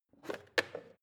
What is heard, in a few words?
plastic water boiler lid off soft; maschinen; wasserkocher; soundpool